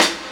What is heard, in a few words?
SD HOU, Dance Mega Drums; Best Service